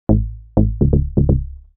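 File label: synth bass, house, 125, A; eJay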